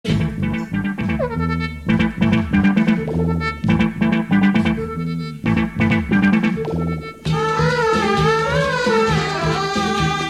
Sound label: Ring Tone